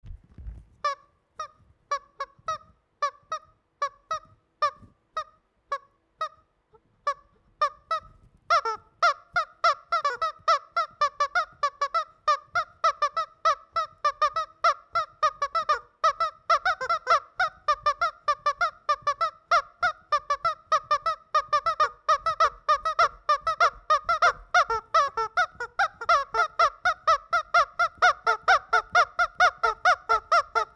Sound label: squeekers, Vietnam; 2019